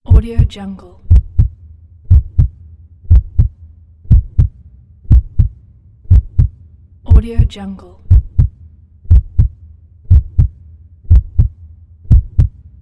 heartbeat

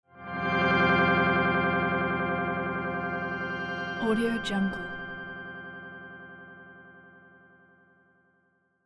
resonant organ intro; mountainaudio

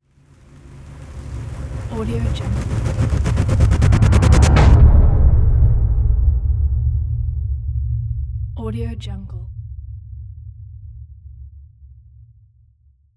cinematic stutter riser impact

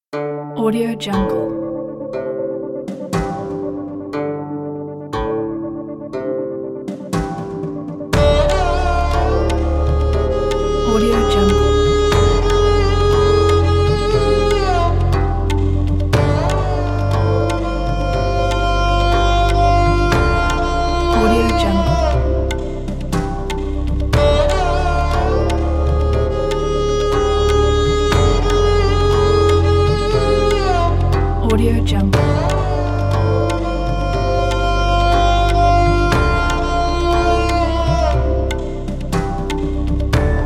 inspirational India pack

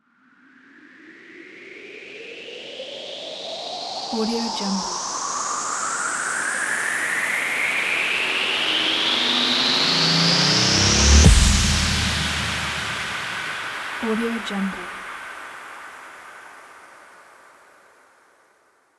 noise boom build up

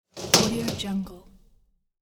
metal cabinet door close

